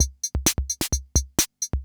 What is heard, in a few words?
CR78; Best Service; XX Large Most Wanted; Sample CDs